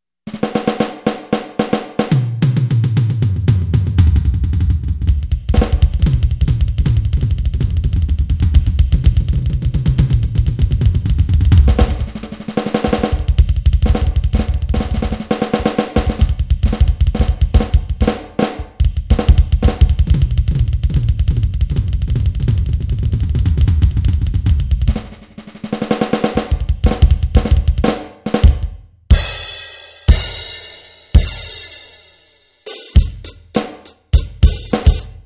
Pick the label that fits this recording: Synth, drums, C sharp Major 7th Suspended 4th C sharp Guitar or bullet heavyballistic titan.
drums